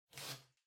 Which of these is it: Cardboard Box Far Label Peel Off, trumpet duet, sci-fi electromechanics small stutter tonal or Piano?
Cardboard Box Far Label Peel Off